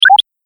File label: Pickup App Game; Video Game Sound Effects